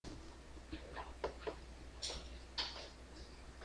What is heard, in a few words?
fire